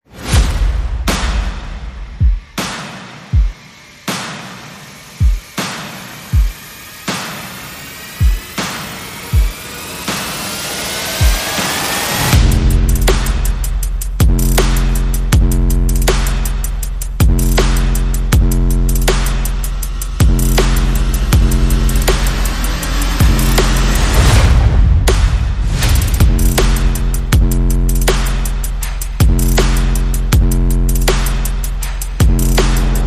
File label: Percussion Bass and Effects